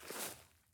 bush small, walking, plant; Factorio

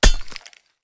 axe mining ore; Factorio

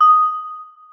celesta, programmable speaker

